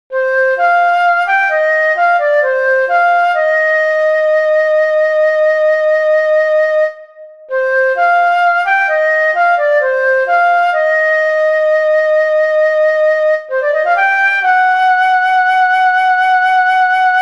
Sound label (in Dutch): Dwarsfluit